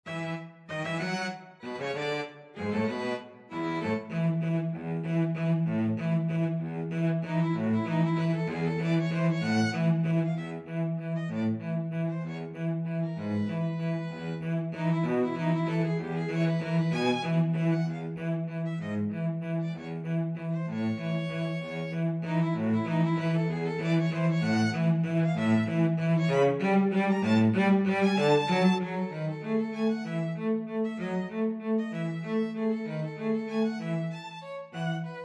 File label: violin cello duet; voices of spring